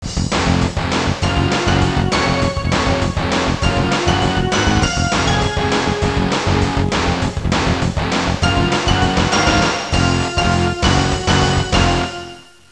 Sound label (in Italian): suonerie musica rock